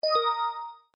Quirky Notify; windows 7

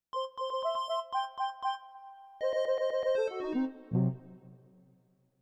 Arcade Victory ~ Arcade Death Lost Quarter

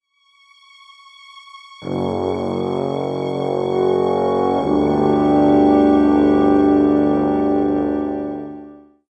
Medieval Foreboding; Civilization Revolution; Xbox

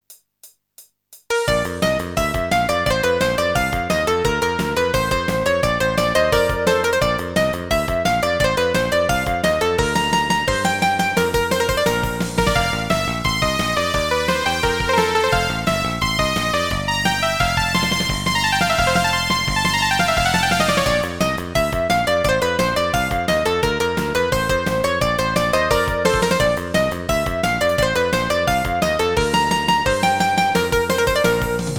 Piano Sonata KV-570, Movement III; Mozart; All Sounds of Artdink Game Music

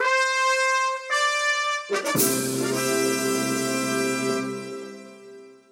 Level Victory Sound; overcooked 2016 pc

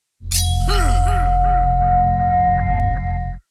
Ring; Puzzle Chronicles; PS3; PSP; X360

